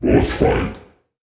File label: Bossfight; Johnny Trigger; Android